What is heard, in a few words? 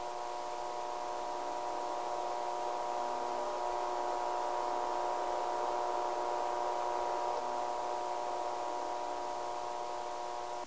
ambience; backrooms